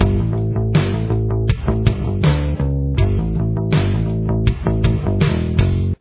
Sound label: chorus bass